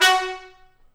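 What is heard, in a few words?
BRS TP STA, brass trumpet stabs; Roland L-CD702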